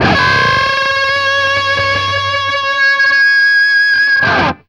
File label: DBLE C#4 CUT, guitar; East West; Steve Stevens Guitar Samples Collection